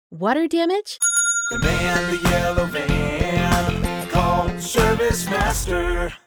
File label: water damage jingle